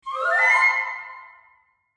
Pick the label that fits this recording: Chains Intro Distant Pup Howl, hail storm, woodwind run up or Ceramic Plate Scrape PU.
woodwind run up